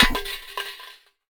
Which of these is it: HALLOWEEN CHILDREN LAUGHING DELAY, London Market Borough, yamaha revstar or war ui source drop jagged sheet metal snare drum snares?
war ui source drop jagged sheet metal snare drum snares